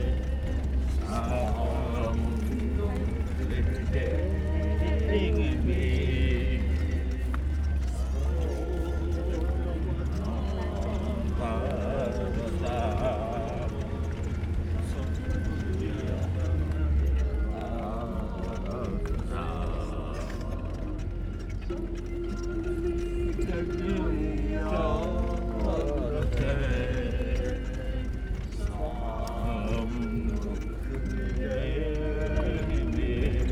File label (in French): Karaoke dans le van; vers Jargalant